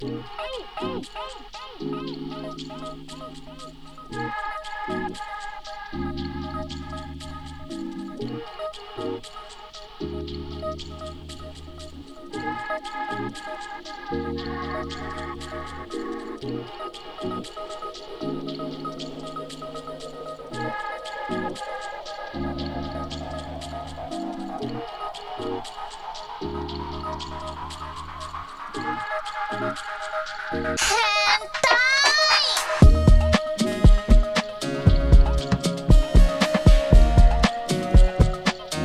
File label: needlessly anime drop